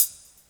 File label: hat C# key; 90s; C sharp